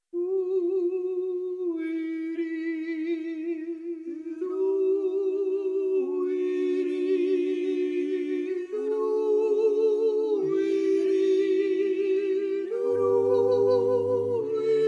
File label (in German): Hollensteiner Jodler